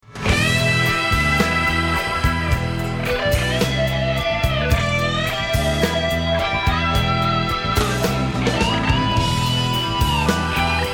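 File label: Pulse compared to Bordeaux, guitar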